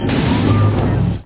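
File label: open door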